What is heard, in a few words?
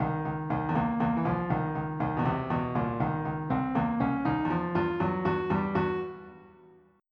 blues; 3800 MIDI Magic